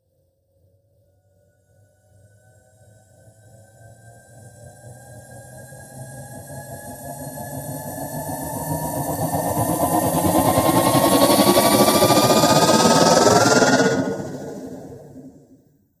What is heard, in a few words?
Risers Metaphysical Engine; Gothic Storm Music; Advanced Sound Design 2012